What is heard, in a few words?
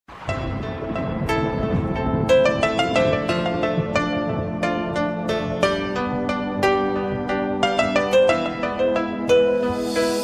romantic tune ringtone; nokia